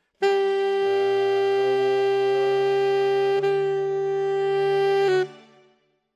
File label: Alto, RNR for piano